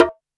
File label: Dholki; 06 Dholki 5